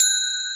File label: beep